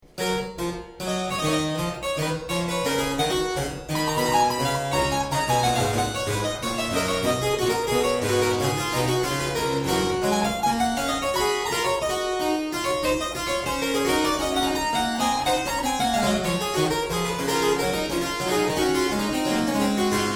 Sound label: Variatio 2. a 1 Clav.; Art: Recuerdo de Ninez by Ignacio Osuna